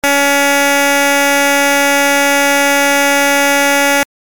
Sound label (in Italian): suoneria beep